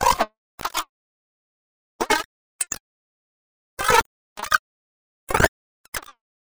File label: robot blips